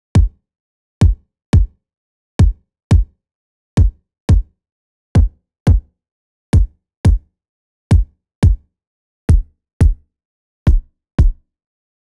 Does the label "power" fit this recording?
No